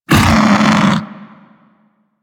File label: Undazoa Vocal Pain, Hunting Critters; Warframe